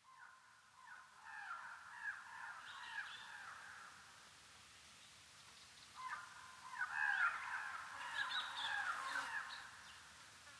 bg ambience; Stampede Call of the Pride; Eyecon

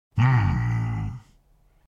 symbol Anim; muerte; Lucha Rumble

